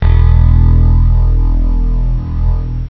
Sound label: OMINOUS